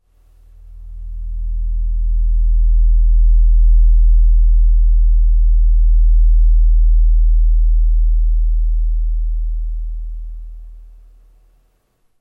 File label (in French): ondes gamma brain tuner set diapason thérapeutique